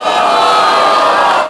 boo; WhiteOut; XBOX; tundra

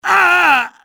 augh; Soldier Of Fortune II Double Helix; XBOX

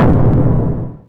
team select; Freestyle Street Soccer; XBOX

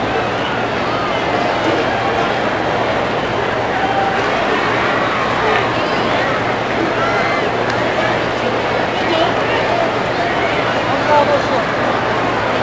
Idle, Crowds; XBOX; AND1 Streetball

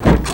spring, sled; WhiteOut

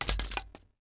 blaster hit concrete; objects; Star Wars Obi-Wan; XBOX

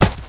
Bullet Dirt, Impacts

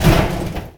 Impact Small; Totaled; XBOX

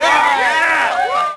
solo; WhiteOut; XBOX; crested

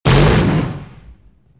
fire, weapons; atst

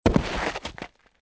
man fall; Soldier Of Fortune II Double Helix; XBOX

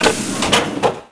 door sliding small; doors elevators; Star Wars Obi-Wan; XBOX

